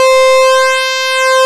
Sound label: PULSE MOD, Prophet Five; E-MU Formula 4000 Series Vol. 3; Analog Odyssey